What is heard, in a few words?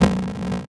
Science Fiction Sci-Fi Electronic Distortion